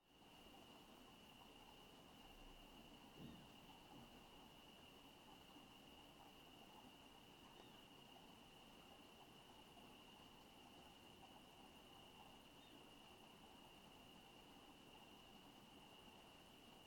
Ambience, Residential, Night, Crickets, Night Bird; AmbiX; Sonik Sound Library; Spatial Towns